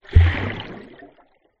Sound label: nature water underwater small splash hydrophone; zapsplat